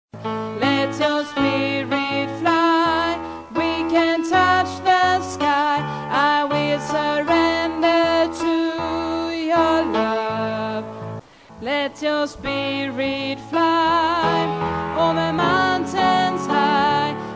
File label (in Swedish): bas, stammor